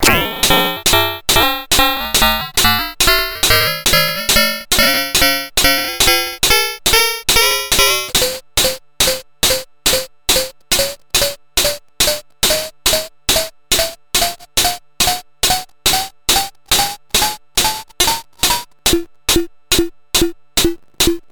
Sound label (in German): Error Instruments Drums Error Drum Presets mit langem Decay